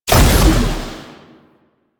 Shot, Weapons; Strun Prime

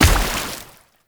Heavy Step Water, Footsteps; Enemies; Foley; Warframe; Tau Dax

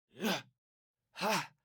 Vocalizations; Garren Deora Intro; Envoy; Garren Boss Arena

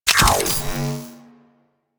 Glaive Equip; Crp Glaive

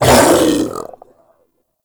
Infested Vocal Attack, Enemies; Warframe; Femur

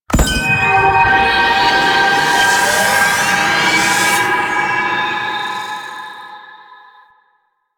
Activate Tower Power Stream; Warframe; The New War

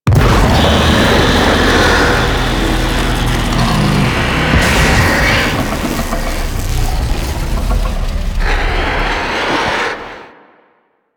Infested Micro Planet Sun Monster Hit Initial; Warframe

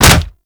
Kick Hit Default; Melee

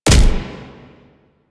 Fulmin Prime Punch, Weapons; Warframe

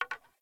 Bow Wood Rattle; Weapons; Player; Bows; Envoy Hunter Bow Ranged Melee

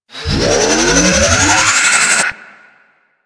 Death Charge; Warframe; Teralyst; Vomvalyst

